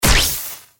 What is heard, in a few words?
electrical fuse burst